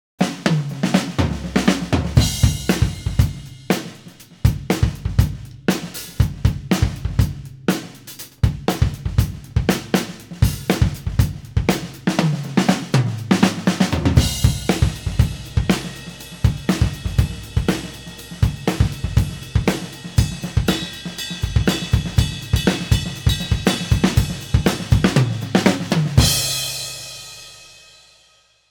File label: Drums Isolated